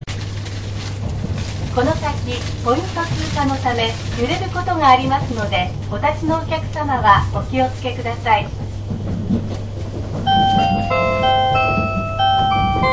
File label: 785-3 home liner information, Railway Sound